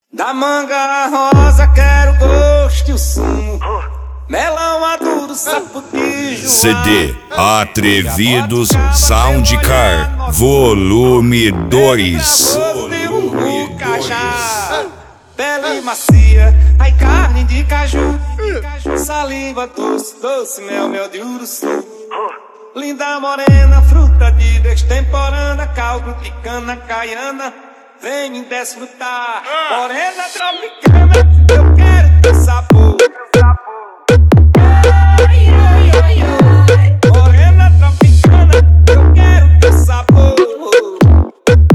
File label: funk